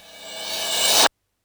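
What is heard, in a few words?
Cymbal reverse, Yamaha DD-6; CYMREV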